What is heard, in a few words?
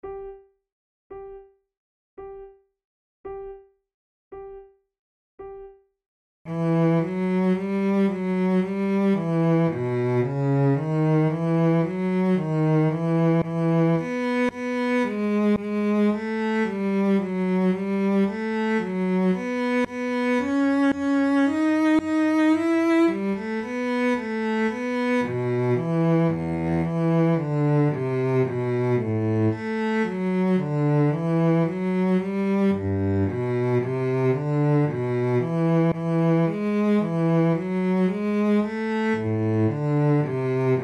adagio, flute